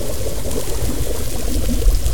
slime

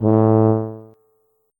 tuba note

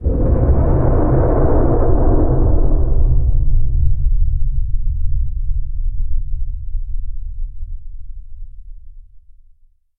powerup

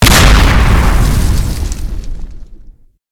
rocket impact, weapons

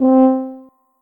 tuba note

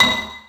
electro bounce, weapons

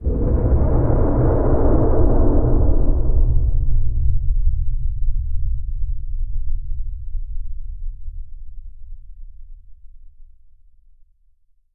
powerup

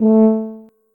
tuba note

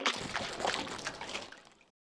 gib

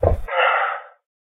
fall

